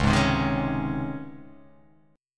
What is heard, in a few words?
Db Major 7th Suspended 2nd Db; DbM7sus2 strummed; JGuitar